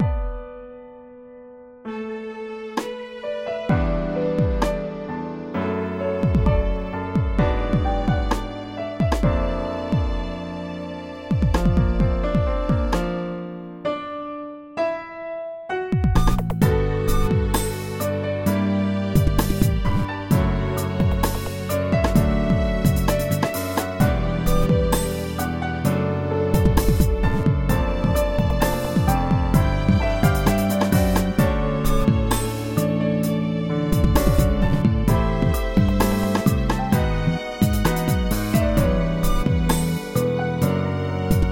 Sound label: strings